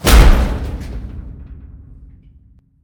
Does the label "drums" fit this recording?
No